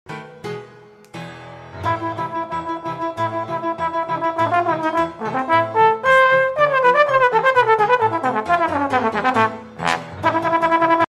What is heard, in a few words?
trombone